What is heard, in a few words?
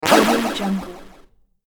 powerup boost